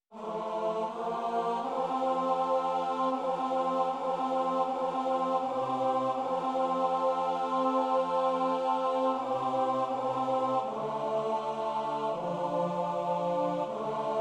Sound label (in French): Notre pere tenor